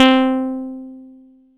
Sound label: Dance Floor Bass, Multisample Basses